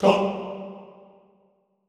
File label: TONG G2, Classical Choir; Best Service; Extended Classical Choir; SHORT TONG